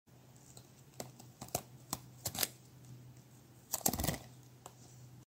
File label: hand fan